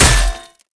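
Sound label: damage axe plate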